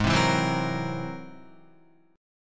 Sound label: G sharp Augmented 9th, Guitar; G#+9 strummed; JGuitar